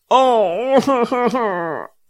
zvuki muzhskogo placha

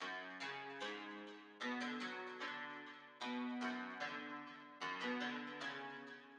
zatsiklennye melodii dlia gitary loop

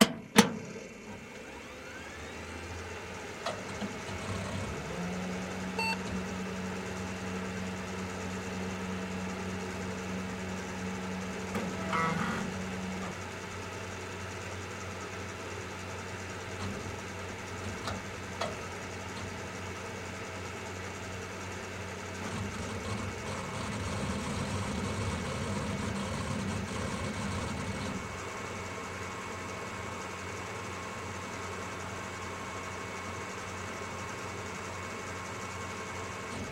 zvuki kompiutera

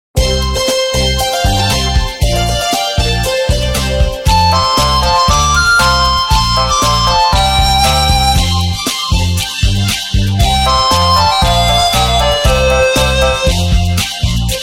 originalnye ringtony i uvedomleniia nokia 808

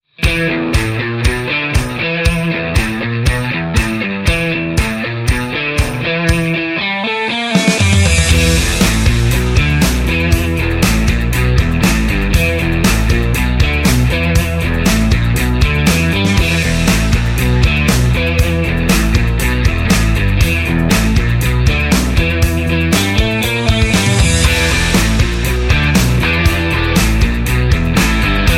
kriminalnaia muzyka bez slov dlia fona

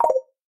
originalnye ringtony i uvedomleniia samsung galaxy s22 s22+

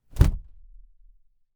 zvuki vafelnogo polotentsa

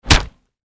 zvuki draki liudei i udara kulakom

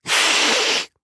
zvuki shipenie kota

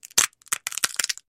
zvuki shchelkunchika

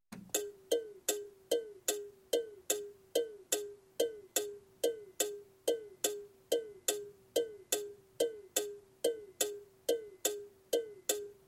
zvuki povorotnikov u avtomobilia

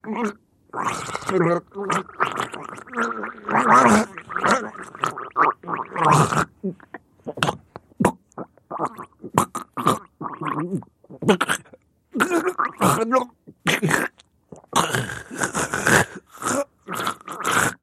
zvuki pererezaniia gorla

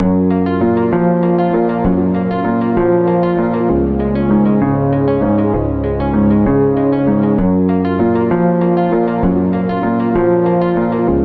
zatsiklennye melodii dlia gitary loop

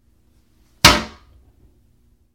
zvuki sidenia dlia unitaza

